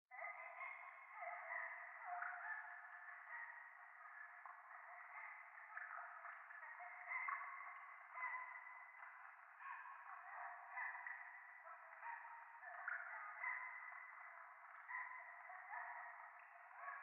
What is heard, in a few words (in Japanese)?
廃墟 喘ぎ 雨上がり